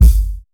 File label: thumpy steel kick drum sample g key; Bitdepth 24